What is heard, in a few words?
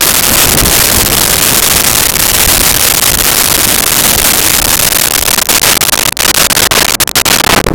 Thunder; Nintendo; July 2020 Gigaleak